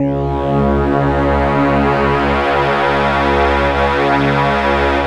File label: C#, Synclav FM; Big Fish Audio; SPACE1